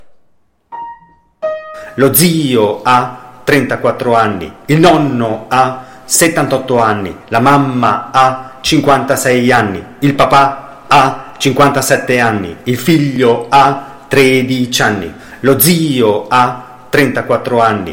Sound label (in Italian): rap